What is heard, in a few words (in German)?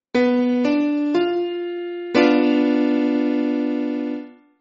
H verm, konzertina; kunstklang